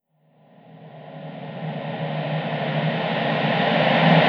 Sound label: reverse, vocal sample